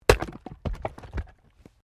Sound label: Wood Chopping Axe; Objects